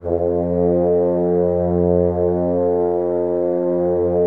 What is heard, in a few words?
French Horns, F1; Best Service ProSamples vol.51 - Classic Orchestra 2